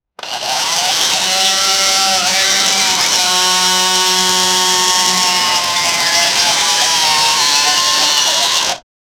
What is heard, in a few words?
electric scooter engine